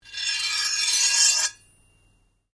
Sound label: Blade Scrape Slow Ring, Sword; Foley sounds for melee weapons; Swords (Sound Effects:Combat)